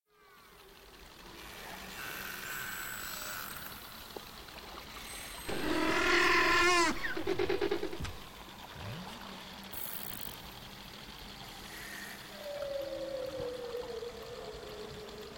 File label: narwhal